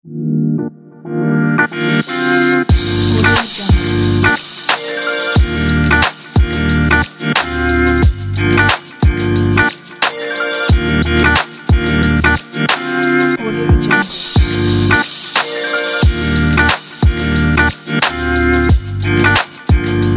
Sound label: hip hop